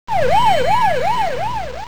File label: ambulance